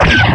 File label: chemgun fire, weapons; XDM